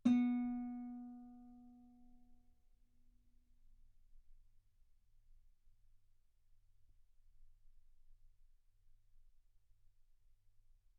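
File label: Guitar ff B string B3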